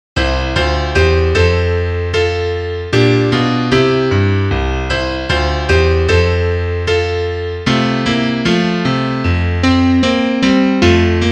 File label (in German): Klavier; kein schoener land